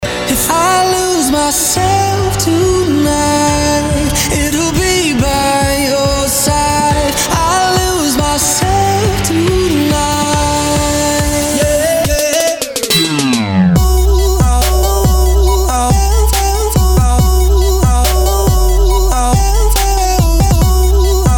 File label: festival trap remix